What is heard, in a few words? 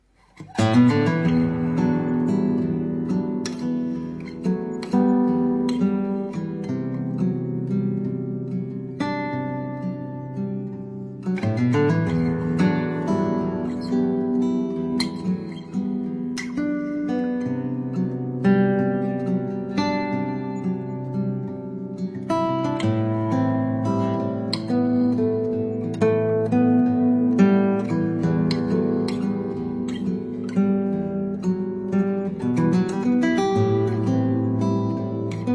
Acoustic Stuff on A Classical Guitar